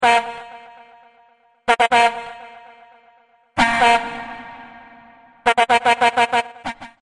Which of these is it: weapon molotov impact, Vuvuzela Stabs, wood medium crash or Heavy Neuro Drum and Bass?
Vuvuzela Stabs